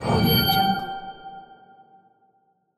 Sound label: horror creak; bogdansfx